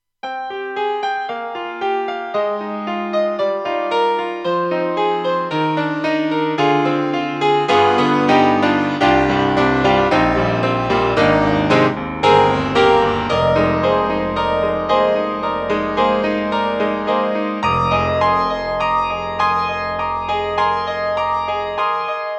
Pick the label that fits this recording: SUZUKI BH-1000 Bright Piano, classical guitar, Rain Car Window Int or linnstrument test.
SUZUKI BH-1000 Bright Piano